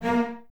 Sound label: Cellos A#3; Miroslav Vitous; String Ensembles; LINKS ORCH